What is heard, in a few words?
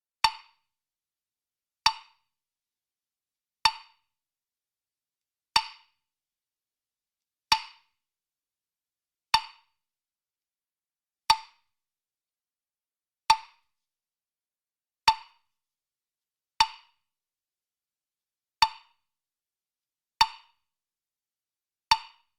woodblock hit